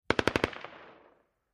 M-16 Long Barrel Machine Gun Burst From Distant Point of View